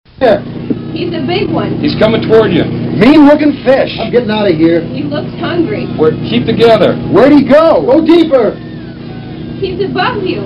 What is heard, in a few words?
idle track; Shark Attack; idle chatter track